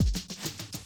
drum loop, 100 BPM

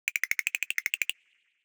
Tiptoe, Classic SFX, cartoon; musicradar; cartoon caper

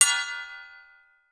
TRASHY METAL, Definitive Percussion

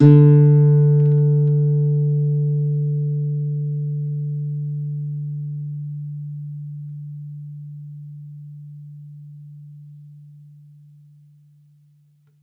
Norm Soft D3, Campfire Nylon Guitar

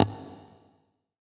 Mute Conga, percussion reverb; MARKYDRUMS

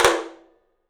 OPEN TOM; 3D Sound; 3D Drums

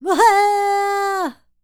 Vocal Planet World Voices, NOMADIC

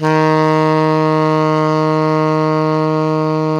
TENOR PP D#3, Saxophones; Greytsounds; Philip Wolfe David Crozier's Saxophones

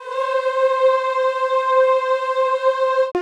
CHO C4; Sound & Vision; Gigapack I CD 2; CHO Zzzz + Vvvv; CHO Vvvvv

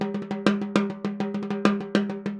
Timbali, Latin Percussion; Sonic Foundry (Sony Creative Software)

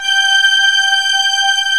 MLT TRK JX3P

